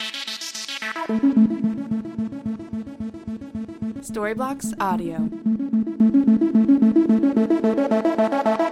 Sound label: Ambient House Arp 110 BPM C